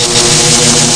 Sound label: shock; Messiah; ELECTRIC